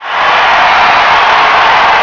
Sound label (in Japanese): kansei, cheer; Nintendo; July 2020 Gigaleak